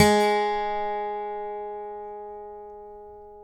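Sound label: acoustic guitar pick G#3; Country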